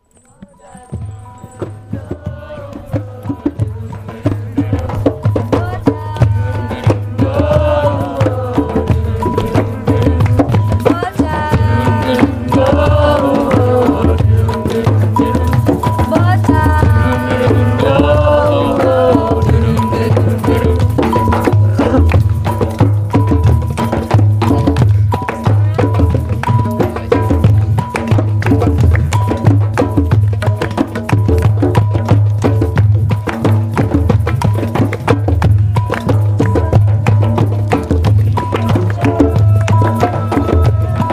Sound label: Nada Bindu Chant